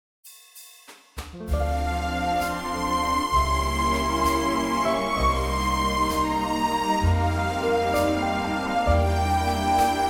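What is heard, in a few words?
orchestra Bb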